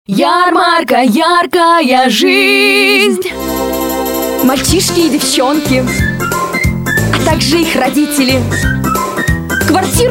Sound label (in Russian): vokal